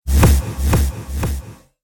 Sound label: Cinematic Strike Double Echo, Trailer Sound Effects